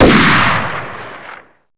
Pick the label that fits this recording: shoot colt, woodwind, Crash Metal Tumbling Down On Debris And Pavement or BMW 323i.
shoot colt